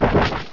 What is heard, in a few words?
Rolling Kick; battle anims; pokerogue